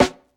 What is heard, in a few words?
studio kick drum b key, bass drum sample tuned to the B note. Loudest frequency: 1128Hz